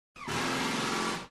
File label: construction